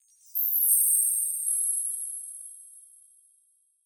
fairy dust; soundscrate

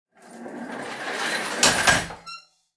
Iron Door Close; soundscrate

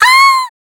horror scream; soundscrate

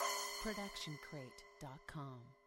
splash cymbal 10 inch; soundscrate

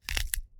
Bone Crack; SoundsCrate

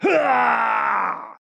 man injured scream; soundscrate

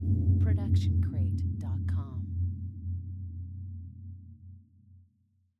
anime ominous hit

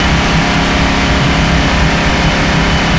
engine loop; soundscrate

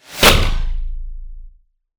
sci-fi blast

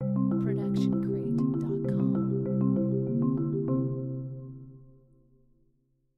Marimba Ident; SoundsCrate

